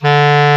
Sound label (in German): Klarinett; Alpine Volksmusik